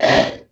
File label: voice sd, Yamaha TG-500